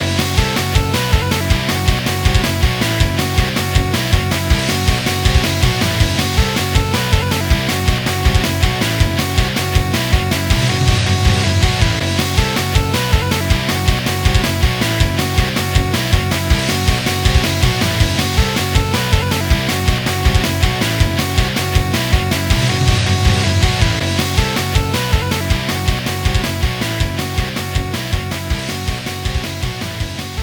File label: 3d Music